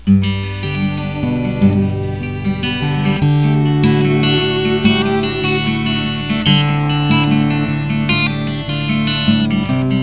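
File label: Cello guitar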